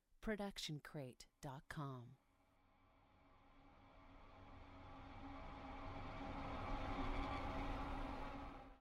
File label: Creepy Reverse Riser; SoundsCrate